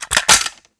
Open Case

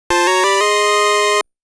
Get Item Fanfare; legend of zelda the link's awakening

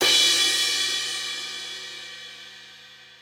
Crash Transition

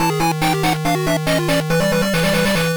Failure Jingle; Shinseiki Ultraman Densetsu Pico gamerip 2002

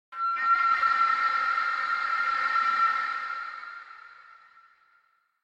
Horror Wakeup; Too Loud

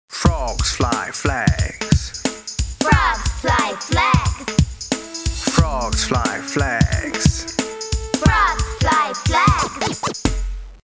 Song Letters F; School; Fisher Price Ready for School Kindergarten

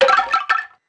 Bone Rattle; knights try

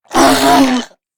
Whistlers VO Death; We Are the Dwarves

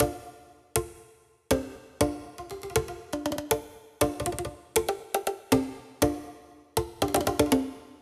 classic perc; rayman origins 2011 gamerip; music world

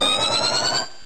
Flame Wheel; pokemon reborn e19 2022

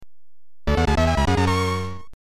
level 3 - completed, soundtrack; level 4 - completed

